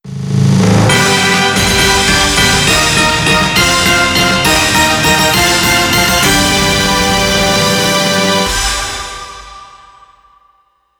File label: Victory BGM; puyo puyo champions 2019